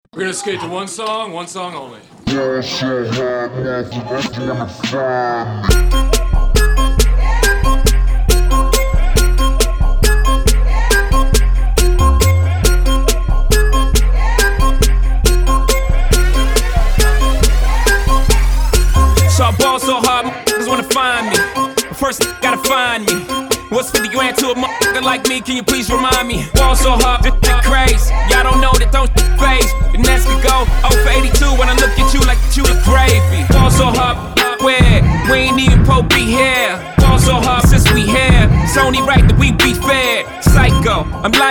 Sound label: funk; multitrax